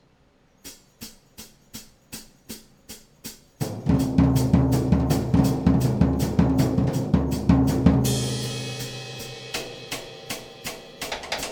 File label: the beat